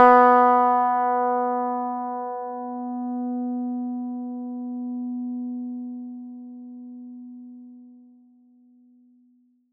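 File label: plucked electric guitar B3; Polyend; Simulacrum Sounds; 1 Shots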